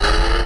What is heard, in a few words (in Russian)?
udar